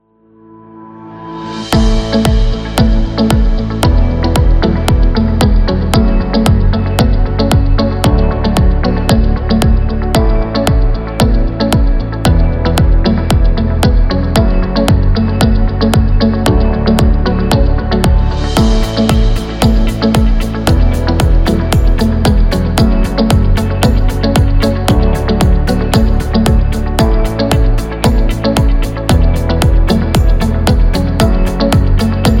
Uplifting Background

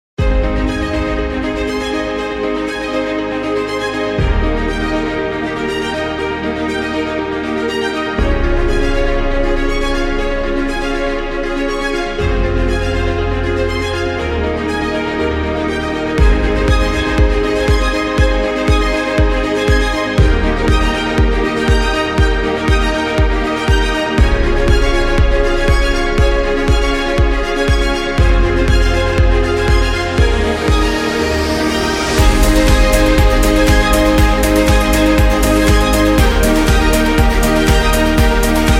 Cinematic Dynamic, corporate music; corporate music vol 18

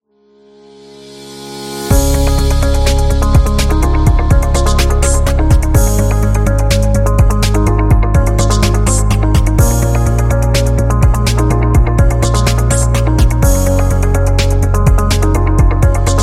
Technology Background

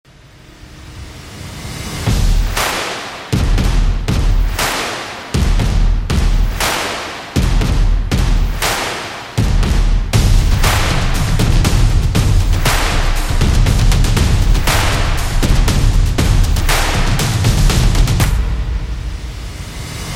Promo Upbeat

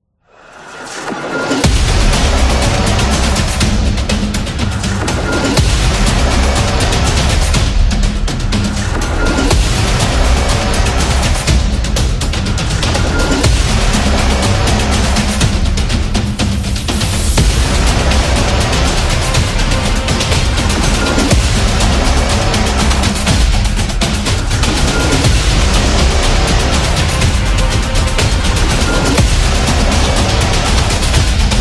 Epic Drums; war drums

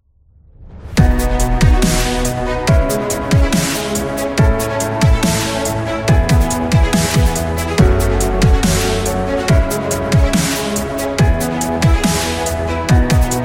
Cinematic Dubstep; epic trailer

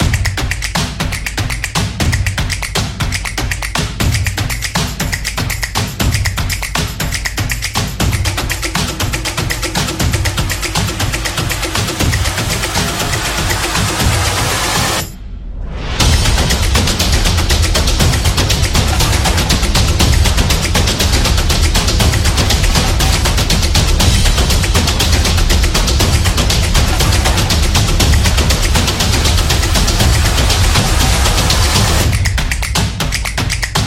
Energetic Percussion; crossfit

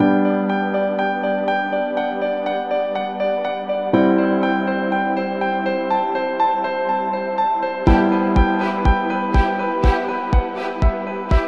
Corporate Health; corporate music vol 15

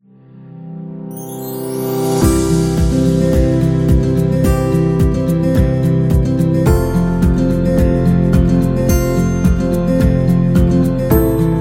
Upbeat Guitar Background; happy music vol 5

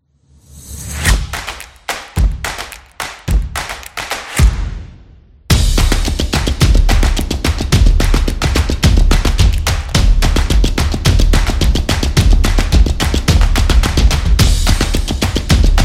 Percussion Rhythm; high energy

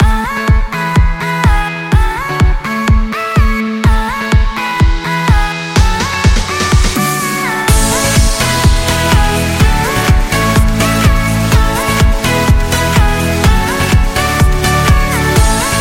Indie Pop; corporate music vol 21

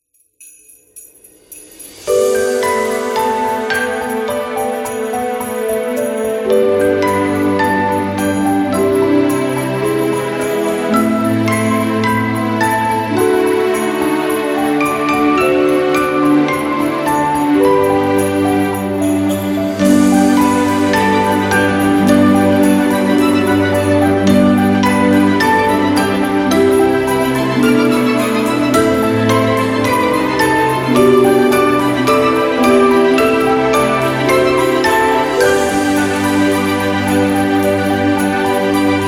Christmas Vibe; xmas

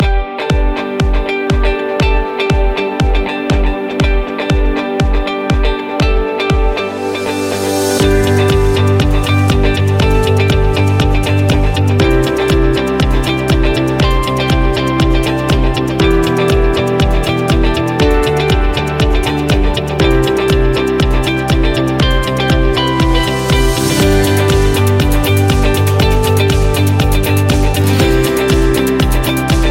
Upbeat Real Estate; business background

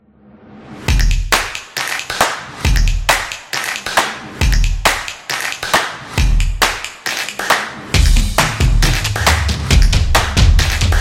Drums Upbeat

